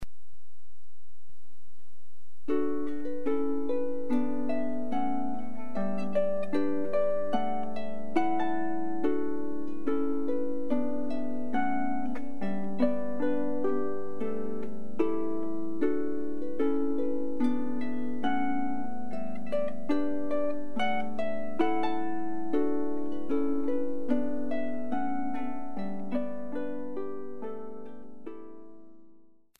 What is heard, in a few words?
harp